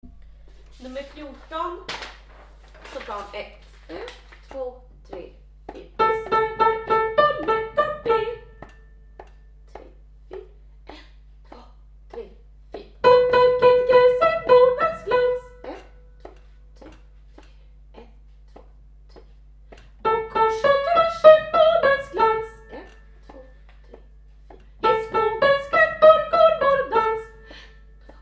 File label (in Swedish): Muntra sopran